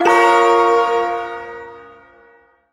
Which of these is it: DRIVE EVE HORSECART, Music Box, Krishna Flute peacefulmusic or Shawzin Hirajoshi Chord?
Shawzin Hirajoshi Chord